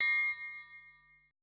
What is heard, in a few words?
TRIANGLE; BPC PERC